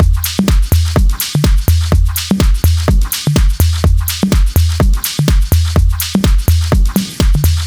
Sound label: Minimal 808; диско хаус